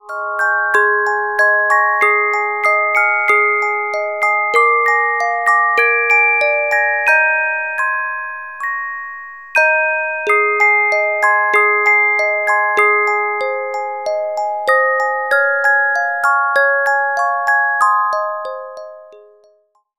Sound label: Carillon Music Box Melodies